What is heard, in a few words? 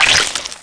Squish; GTA3